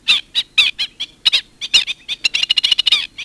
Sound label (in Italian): cavaliere d italia